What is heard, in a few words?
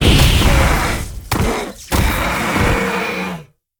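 Mech Bull spawn; monster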